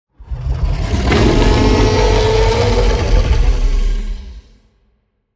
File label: kaiju adult ship entity superweapon; ships; biogenesis